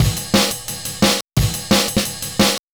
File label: 175, Breaks; Drum&Bass; EZ Rollers